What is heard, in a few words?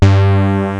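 synth bass instrument; chipmusic; sonix; Stellar 7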